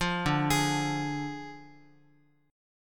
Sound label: D Diminished D, Guitar; arpeggiated